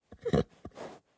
horse grunt; teardown ps5 windows xbox series xs gamerip 2022

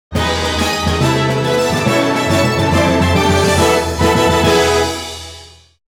Victory Jingle; Busou Shinki Battle Masters; F1 BATTLE

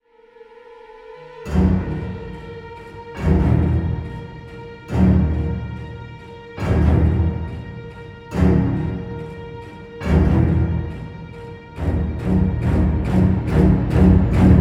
suspense

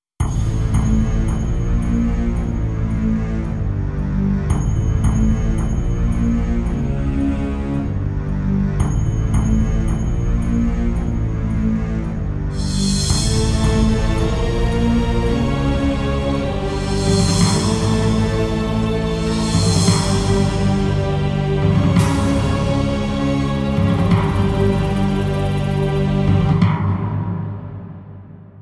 Call To Arms; Prince of Persia The Sands of Time

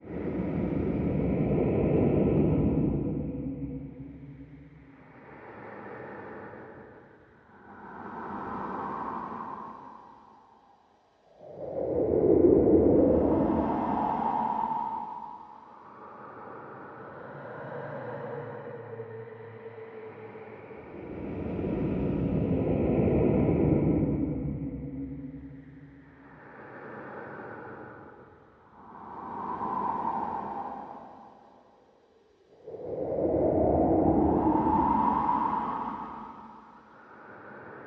Dark Breath Ambient

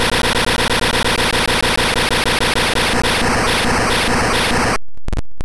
Fire Blast; Pokémon SFX Gen 2 Attack Moves; GSC

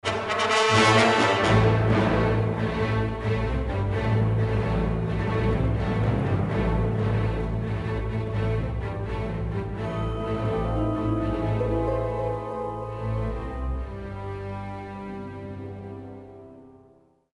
fight end; Star Wars Episode I The Phantom Menace

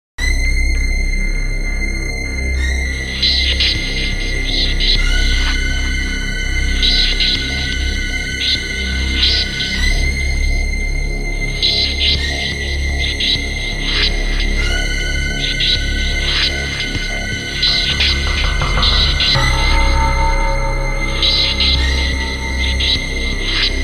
Sound of a Bell; Corpse Party; Haunting Melodies

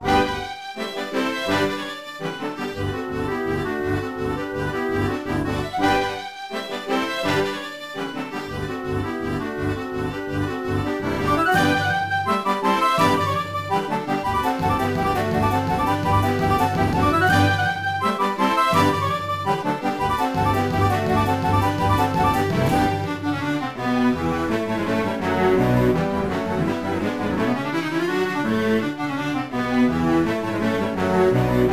Taiko Bgm; nodame cantabile

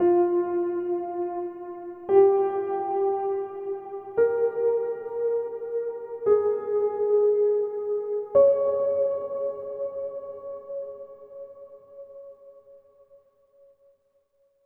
Overlay Tone; The Shard

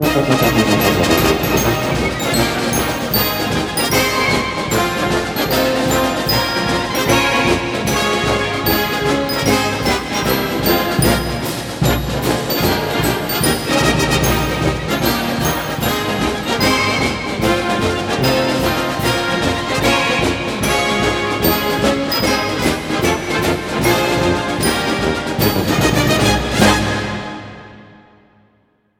Fight Song; NCAA Basketball 09; PS2, PS3, Xbox 360

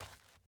dirt step

